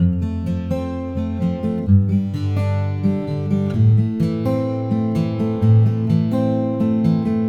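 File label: Acoustic Latin Guitar 128BPM A minor; Vengeance - Essential Clubsounds Vol.4; Guitarloops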